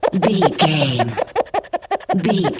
cartoon squeaky cleaning loop

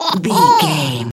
goblin fairy laugh deep

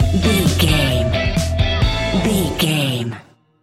A fast paced piece of electronic dance music; perfect for the nightclub, and a youth orientated visual scen

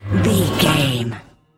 manhole metal slide door open close

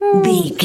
voice fun small character emote sad

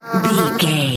fly buzz flying

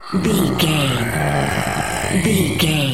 zombie voice groan

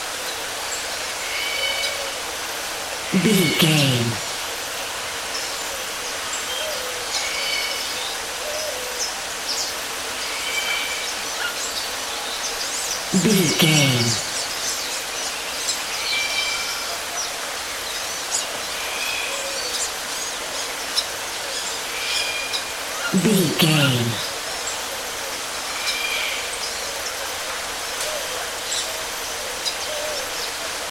birds tropical forrest ambience loop

